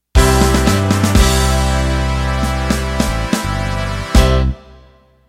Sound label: Motown End